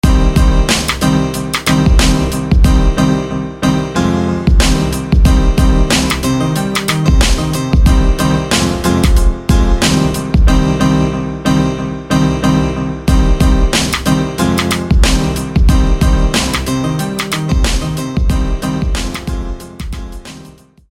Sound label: Piano Chaotix; SFB FX